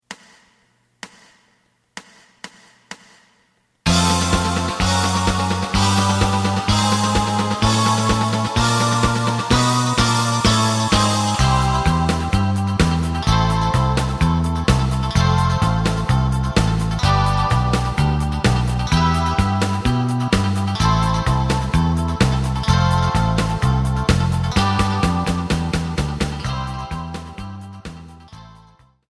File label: lead